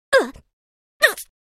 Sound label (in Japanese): 被弾（空中）「うぅ！うっ！」